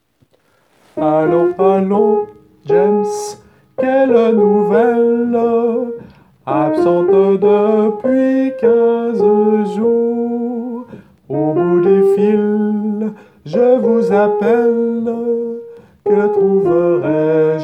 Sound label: soprano